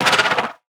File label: damage; Barotrauma; Hammerhead